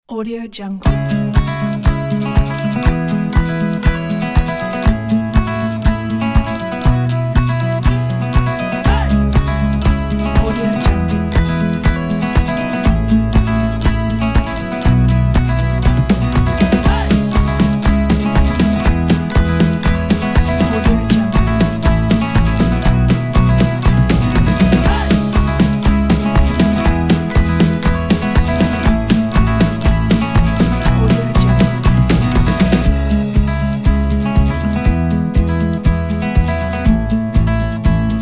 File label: inspirational happy americana